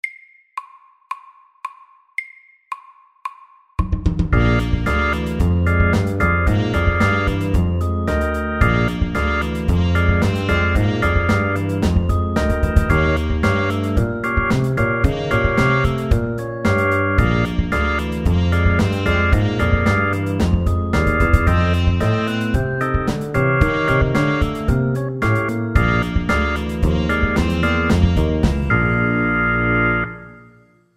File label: 12 bar blues MEDIUM 112